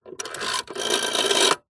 household telephone old fashioned rotary style recorded underside dial no four